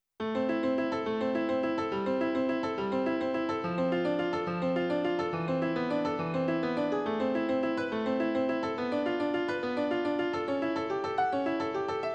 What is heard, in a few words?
Etude in A minor for piano; Haarlem